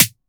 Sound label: Tinny tinny, snares, drum hits; Nu Dance Electro